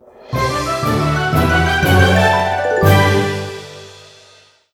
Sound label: BGM Stage Success